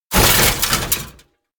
bike fall; anemoi

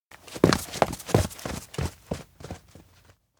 steps indoor dash; chiharu; jet; anemoi パッケージ版 1.1

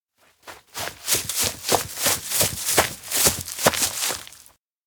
steps outdoor grassland runup; anemoi

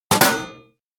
arm break; anemoi

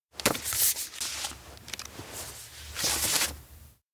sketchbook take open; anemoi

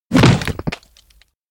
catch thick branch body; anemoi

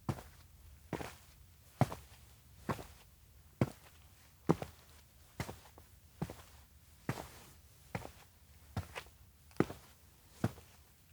steps indoor road walk; LOOP; anemoi パッケージ版 1.1

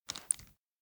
map tear drop; awayuki; anemoi パッケージ版

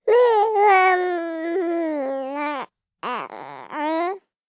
baby voice; anemoi

